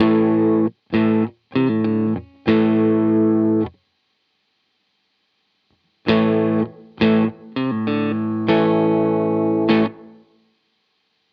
Walrus Audio ACS1 London Room Ambience

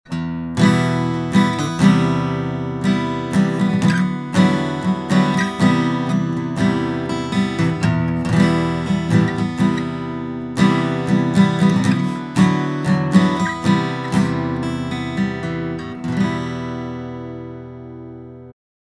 sanken CU-44x Mk2 Steelstring

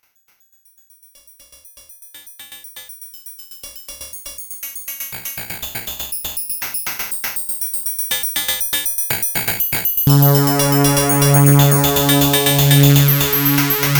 Dual Voice

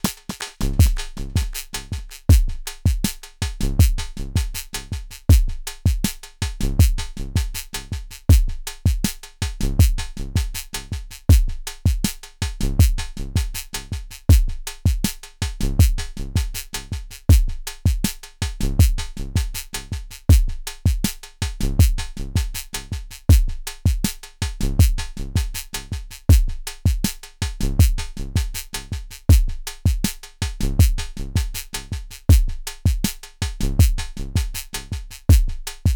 Delay Drums Pedal Hardware; BIAS Pedal

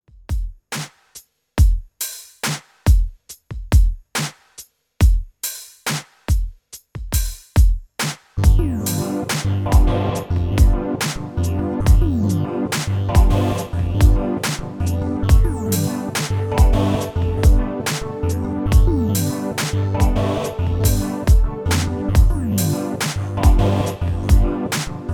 Korg KROSS 2 Synth Combis

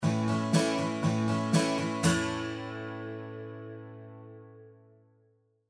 PCM81 Chorus

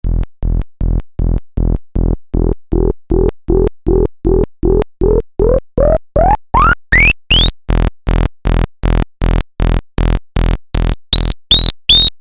Alert

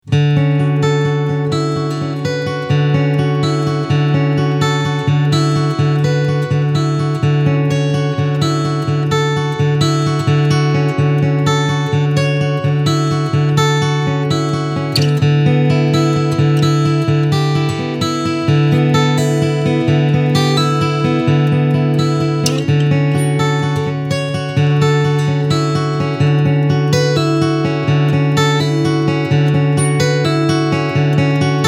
Picking

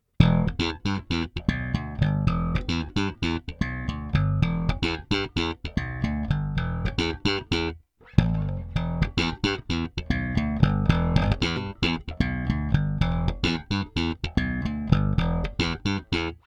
Both full slap

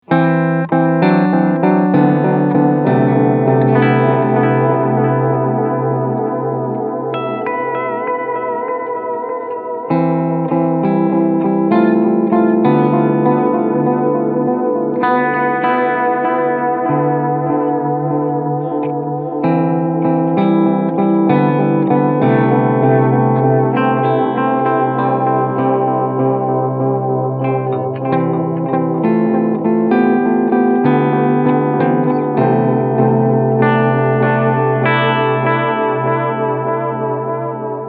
Echorec Single Head Medium Delay; Catalinbread

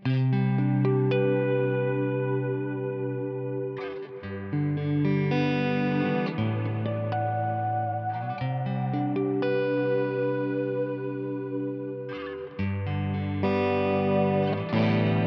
Reverb Bloom

